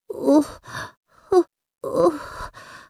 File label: gonome idle